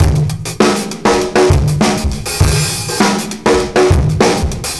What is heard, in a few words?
R&B Drums